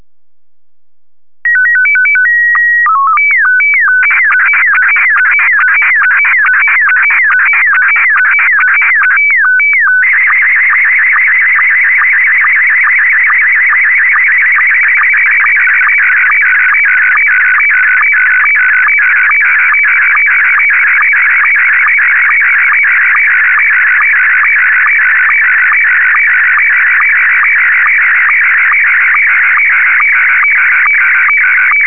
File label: SSTV test; ham